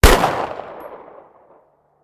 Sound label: fire, weapons; Benny Placeholders